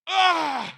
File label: Voices male pain shout; Out of Reach: Treasure Royale